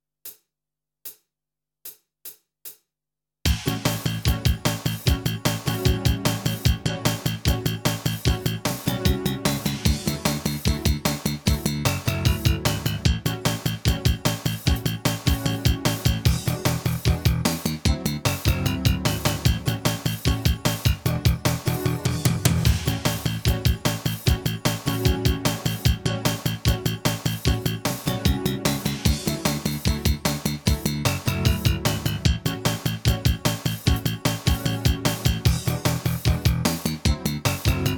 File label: blues 8 beat long, guitar